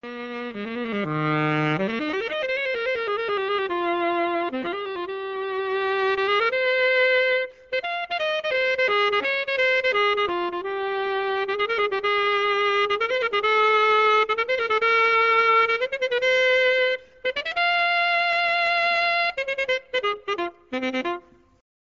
tarantella

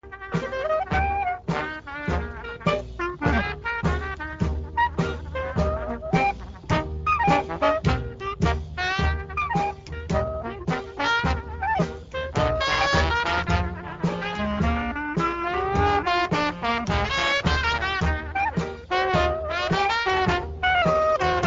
careless love blues

